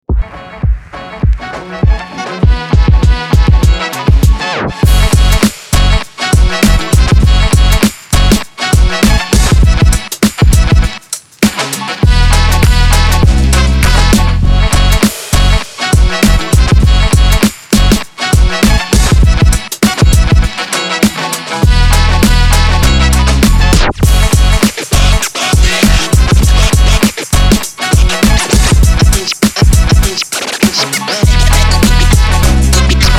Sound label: groove hip hop groove; groove2grow